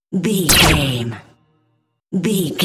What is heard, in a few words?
cinematic stab hit trailer